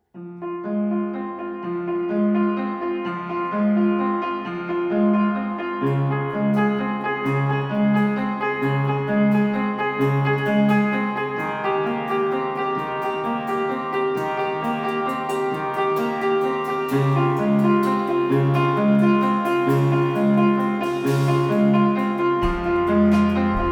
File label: Piano song